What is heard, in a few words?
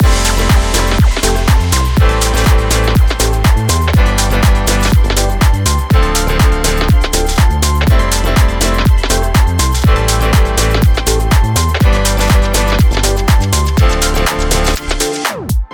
energetic background upbeat